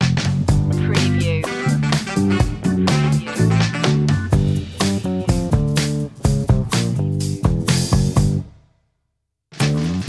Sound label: funny trick stinger; slinky